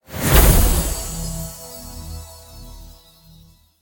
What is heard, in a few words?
UI XP Level Up; masters of anima 2018 pc gamerip